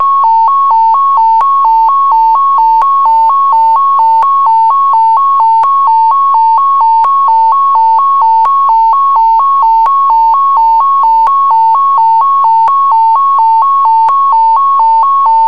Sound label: Nuke Alert